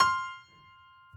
piano sus

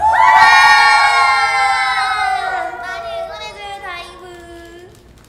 yell; osu

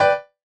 buildup

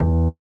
bass E3

